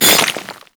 Melee Impact Cement

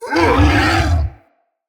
Corrupted Pain, Animals; Bromius

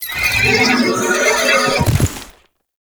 Horse Idle Six; Warframe; Duviri Horse; Vehicles

